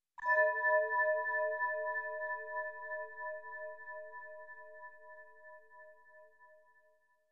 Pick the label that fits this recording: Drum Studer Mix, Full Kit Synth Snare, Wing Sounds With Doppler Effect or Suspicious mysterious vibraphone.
Suspicious mysterious vibraphone